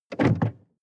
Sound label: sports car int trunk open medium; verkehr transport; autos